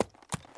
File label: horse walk; Mount&Blade Warband